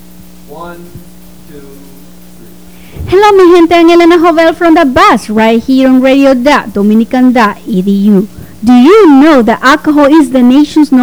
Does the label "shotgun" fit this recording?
No